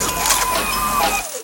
Gun Draw Select Scifi, Weapons Foley; SFX Kit

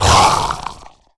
zombie attack; DeadFright

